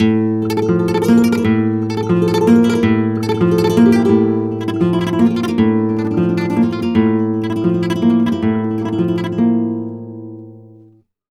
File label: Flamenco, Guitar, Tremolos, 176, a